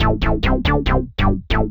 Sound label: SNTH BASS ELCTR 140 A, Techno